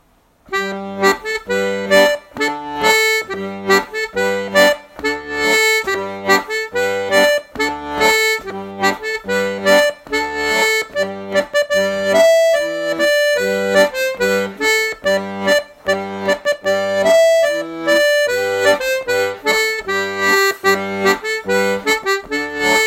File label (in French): Laridé De Kervignac En Sol, accordeon